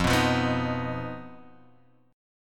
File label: B-Major Flat 5th F